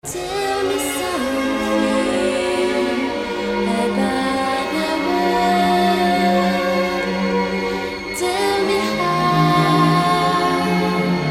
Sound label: ORCH